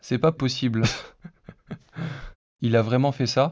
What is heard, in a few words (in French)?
rire synthese contextuelle